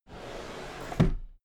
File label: drawer cabinet equipment close regular